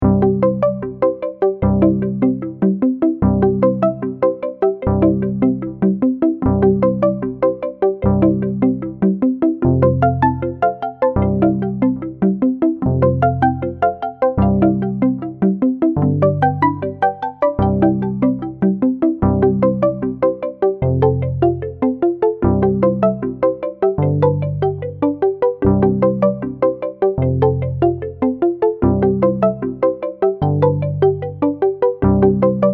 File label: Twinkle Twinkle Little Star No Melody Slow Tempo 75bpm, ukulele; Rainbow Ukulele Play-Along